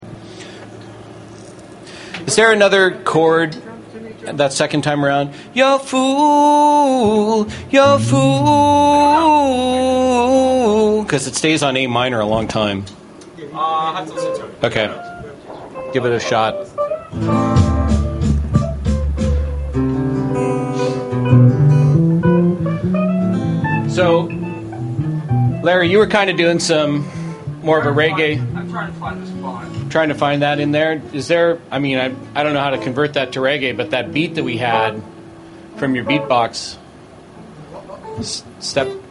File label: Background Mic Mix